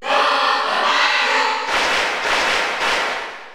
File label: Cheer Spanish; Dr. Mario; SSBU